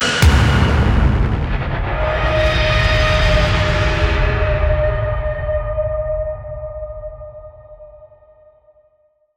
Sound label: Tension Builder Impact